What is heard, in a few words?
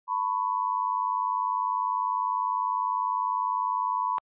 AM 1000 sin 10